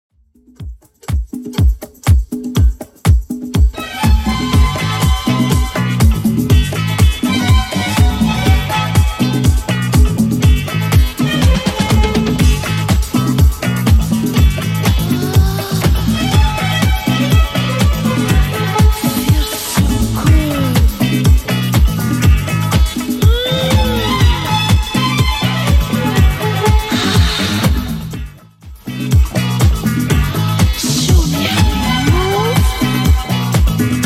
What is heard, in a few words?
70s Redrum 122bpm